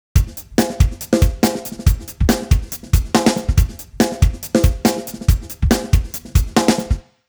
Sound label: drums; celemony melodyne; timestretch